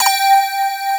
BELL, HOUSE; WORS STATION

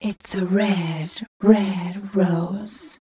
f mel d, fem Vocals

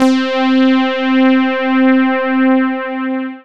Synths Note C

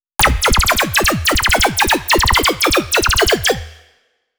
laser rise, bass

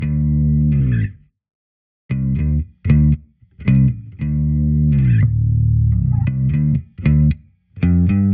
D Bass Quantum; Zero-G; Future Wave

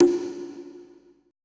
CONGA R; BPC DRUMS

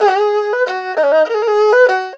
ERHU LICKS

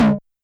Tom Ana Dist, YAMAHA RM1X

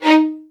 D#4, LOUD VIOLINS; Q Up Arts - Denny Jaeger Master Violin Library

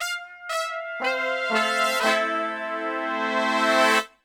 FANFARE LICK, Brass; Best Service; Brass Super Section

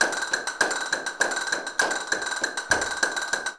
CASTANETS, Flamenco; Best Service ProSamples vol.46; AKAI

